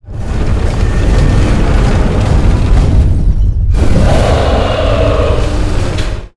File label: Clockworks Entry; FlyForInsanity